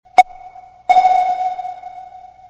submarine ping; ringtones; sms

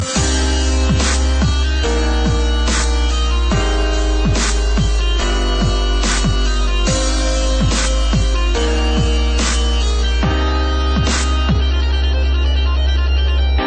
dubstep

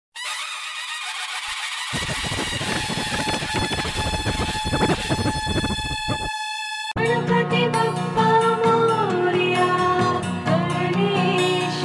rock

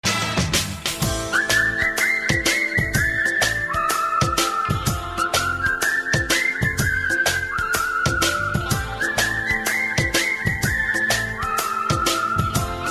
COOL WHISTLE

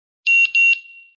mms beep tone; ringtones; sms

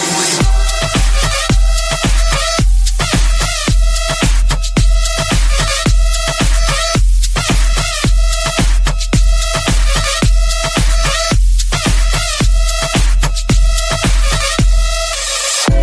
Moombahton

